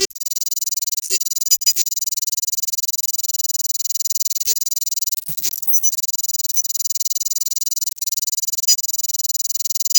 create a short second, jump

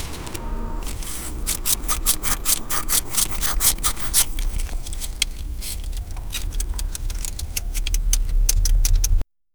cleaning the headlight of, bike

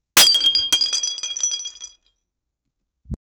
a glass smashing off the; break

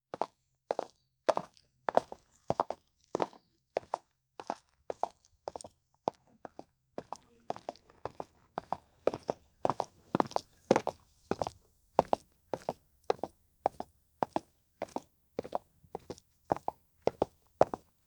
isolated horse footsteps, cobblestone

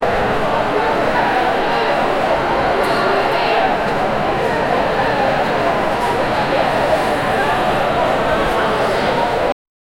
coliseum crowd, arena

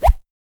the sound of jumping in, human activities

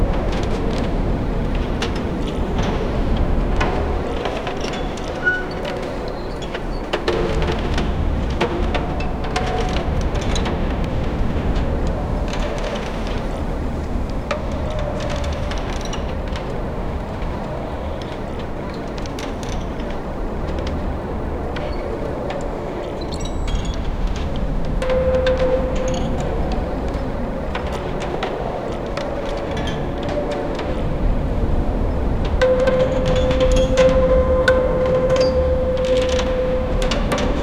a desolate, field recording

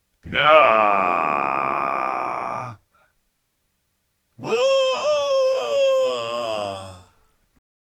ohhh from a sad man

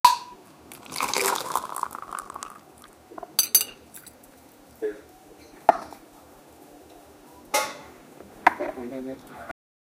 drinking tea at indian; culture

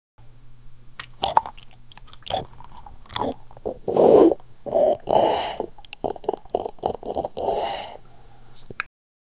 drinking cold drink

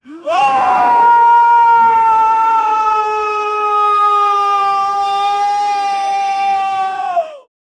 close range scream of an; drama

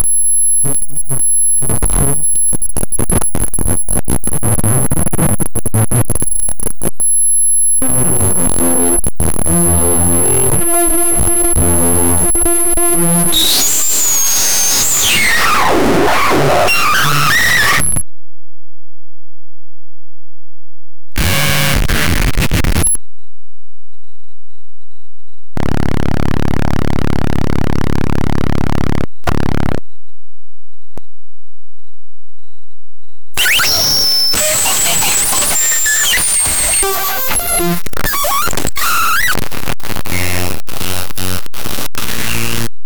the sound of a baby, bathroom

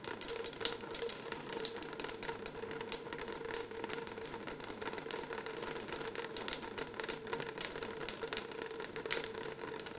smooth coffee dust fallin

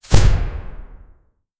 short soft missile launch; explosive tail

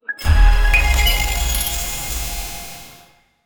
cartoon fantasy ice nova

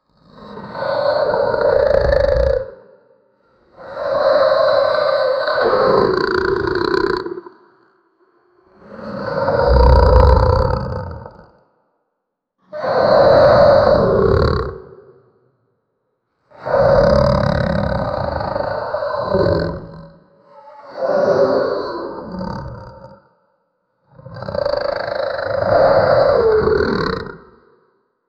mechanical cybernetic wol, cybernetic

